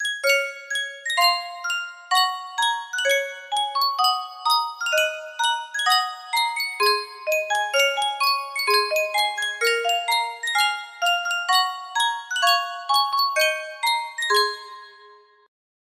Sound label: Sankyo music box; russia national anthem